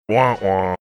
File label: wah wah